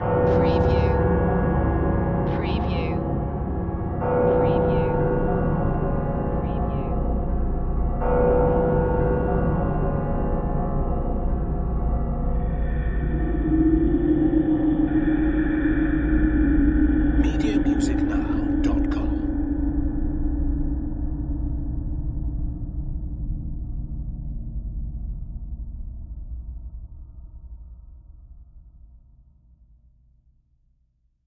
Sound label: Clock Chimes Eerie